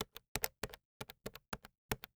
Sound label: Switchboard Telephone Dial; Communications